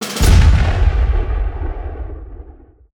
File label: sfx cannon ball found; Seven Seas Solitaire